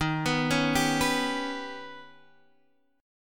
D sharp Augmented 7th, D#+7 chord; arpeggiated; Guitar; x,6,9,6,8,7; JGuitar

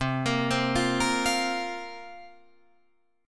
C 7th Suspended 4th, Guitar Drop D, chord

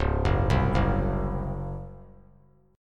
Db Major 7th Suspended 4th Db down; arpeggiated; JGuitar

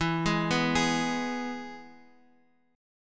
E Major guitar chord, Open F; arpeggiated; JGuitar

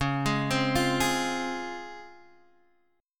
Db Major 7th; arpeggiated; JGuitar

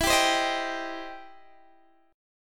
E Minor Major 7th Sharp 5th, Guitar, Open D; x,19,17,18,18,17; down

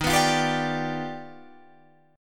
Eb Augmented Major 9th Eb down Guitar Open D

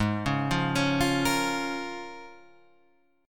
A sharp Minor 7th, G sharp

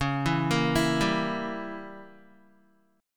Db Diminished 7th Db down Guitar; arpeggiated; JGuitar

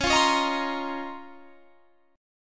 C sharp Major 13th, chord; C#M13; strummed

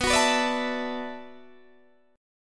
B Minor 11th B down Guitar DADGAD; strummed

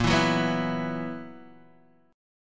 B Major 7th Suspended 4th Bb

